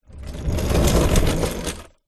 industrial mechanic garage creeper dolly wheels roll floor